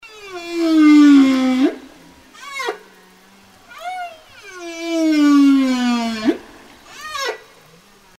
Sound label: whale